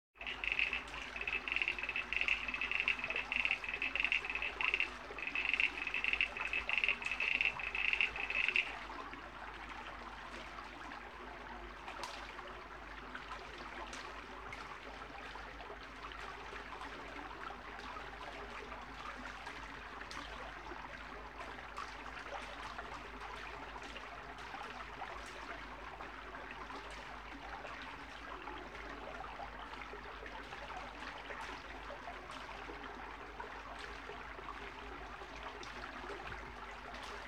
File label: Crickets in the field; KMP Film Video Sound Effects